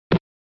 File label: SNES Fighting Land